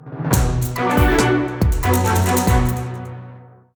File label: Draw